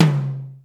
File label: ROCK T; BEAT2000